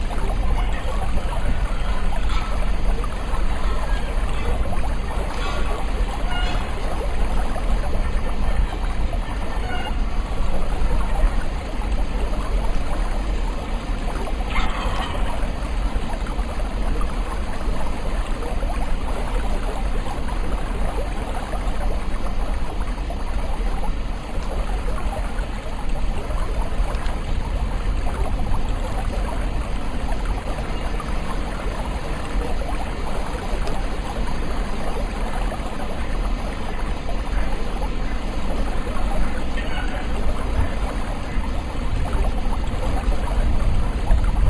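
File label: farm village ambience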